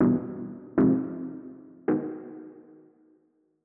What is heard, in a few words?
MELODY, LOOPS; DUBPULSE